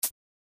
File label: cut; timberpig